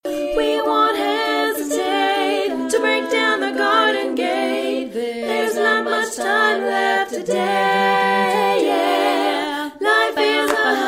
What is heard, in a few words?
bass feature; sweet adelines international